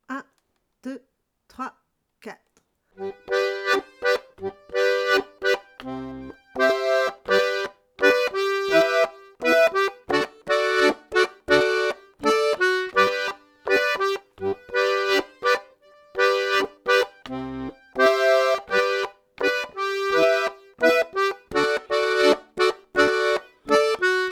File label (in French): scottisch rythmique